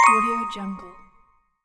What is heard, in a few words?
confirm button sound